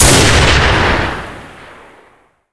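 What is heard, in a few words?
crossbow fire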